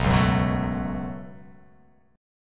B 7th Suspended 2nd; B7sus2; strummed; JGuitar

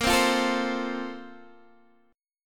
A sharp Major 7th Suspended 2nd, Guitar DADGAD

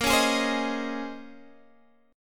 Bb Minor 11th Bb

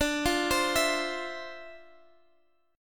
D Minor 9th, down; Dm9; arpeggiated

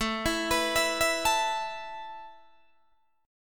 A Suspended 2nd A Guitar Open D

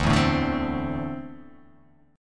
D 7th Suspended 2nd down, chord; D7sus2 strummed

